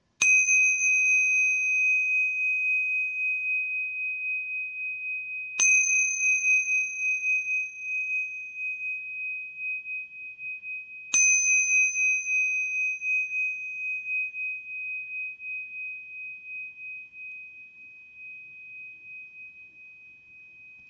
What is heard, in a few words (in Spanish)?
tinghsa re lisos, cuencos tibetanos